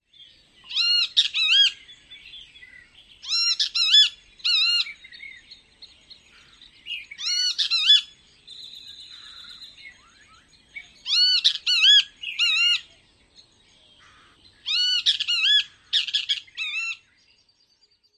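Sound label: dawn song, bird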